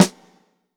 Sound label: snare, Zoom MRT-3B